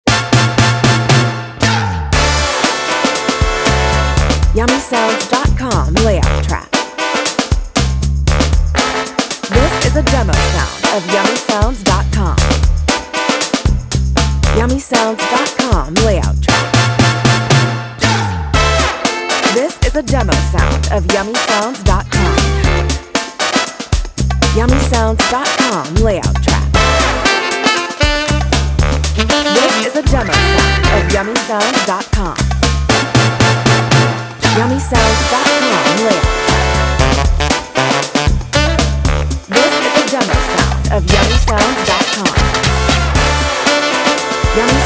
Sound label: Funk That Beat; Yummy Sounds; SoundTrickz